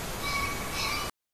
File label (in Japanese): kiji; キジ目; キジ科; キジ （雉）; 忠魂碑で、 鳴き声; 蘭梅山; 野鳥